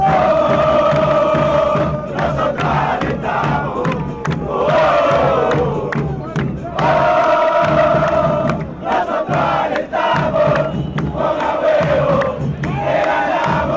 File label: chant, argentina; patronato